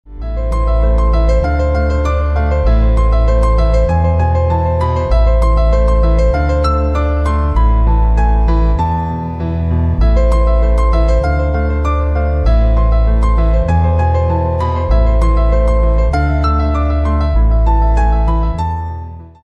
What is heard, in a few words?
soulful love bgm piano cover; kadhalar dhinam